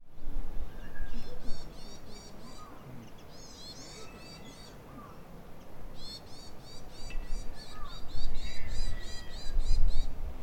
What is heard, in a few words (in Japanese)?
yamagara